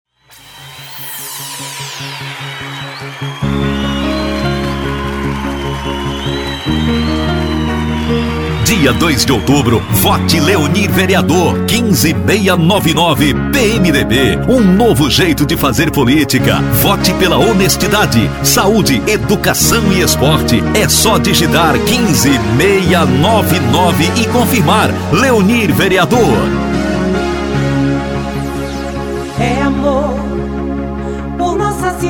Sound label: jingle; vereador